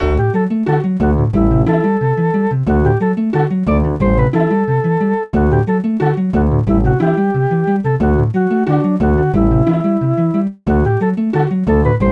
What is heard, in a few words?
Music Action; Emma at the Farm; nds